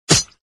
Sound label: sound attack hit; hitsound